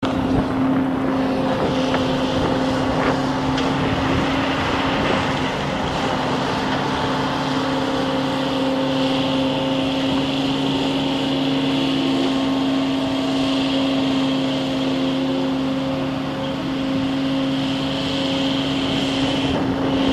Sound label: Street Cleaner; Front yard, my house; tractor